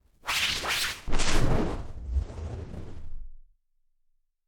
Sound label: Swishes